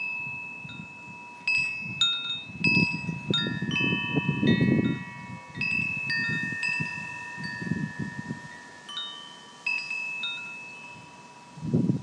wind chime after several failed attempts

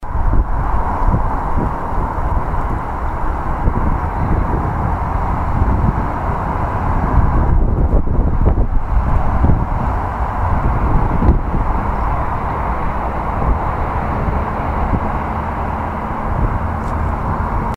windy day at ashland; telstar

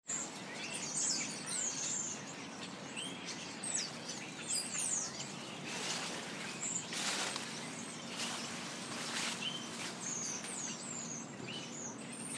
roosting bird noises; Lincolns Inn Fields

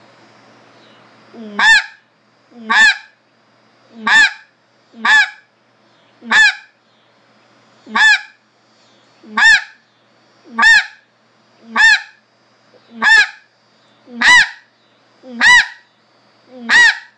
barks

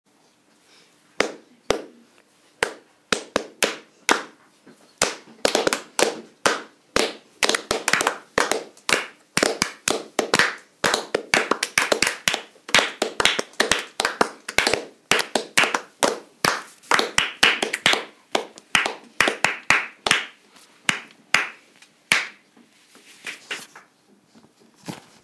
clapping piece

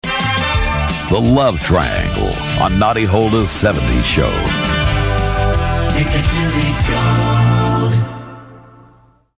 jingle; piccadilly gold noddy holder s 70s show

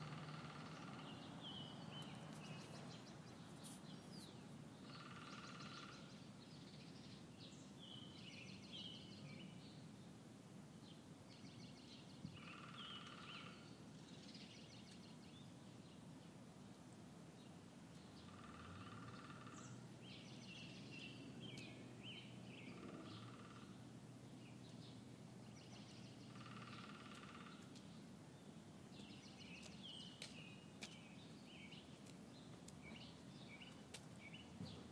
sounds of a woodpecker in brooklyn

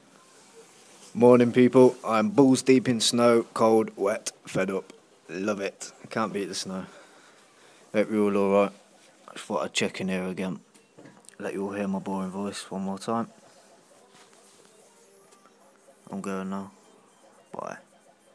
haha

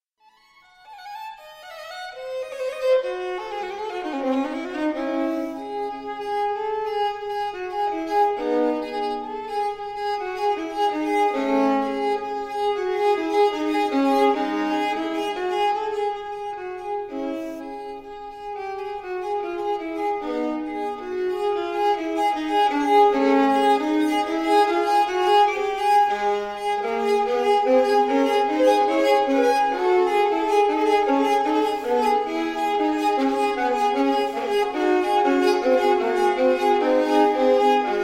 strings

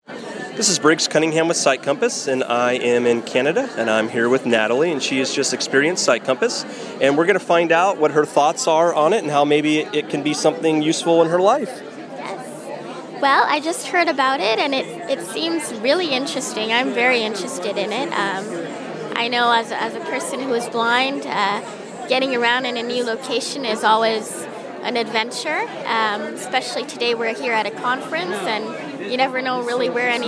techno

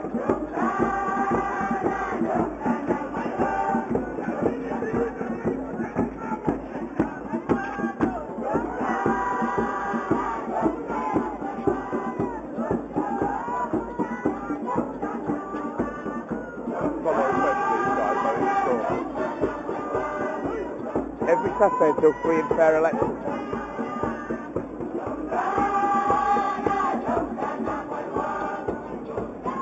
protests outside zimbabwe house london

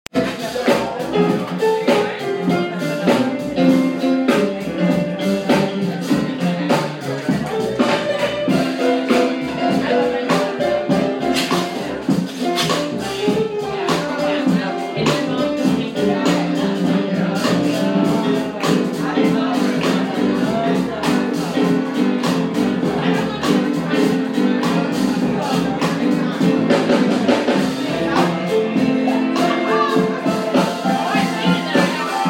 jazz

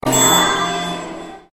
ring

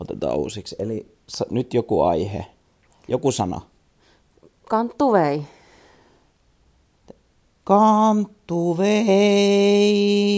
surrealistic improvisation

